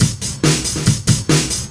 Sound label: LoFi Beats, 140 BPM